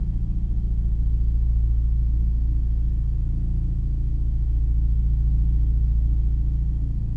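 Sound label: room small ambience; Star Wars Obi-Wan; XBOX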